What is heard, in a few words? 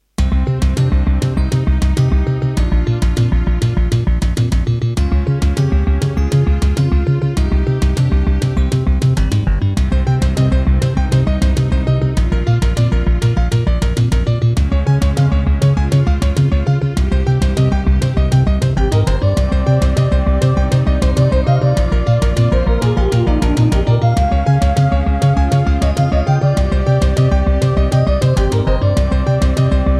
Yamaha MU2000EX XG MODE; Introduction; Hocus Pocus